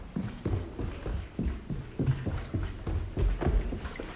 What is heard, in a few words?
stairs